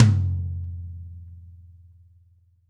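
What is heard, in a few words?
tom low, dry kit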